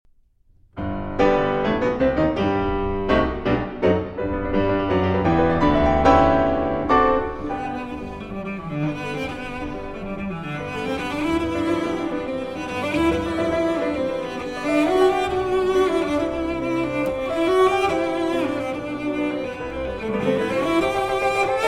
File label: Cello Sonata in G Minor Allegro Mosso